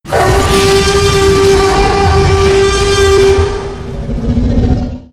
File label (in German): t rex sound t rex schrei; jurassic park